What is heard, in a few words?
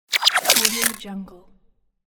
photo camera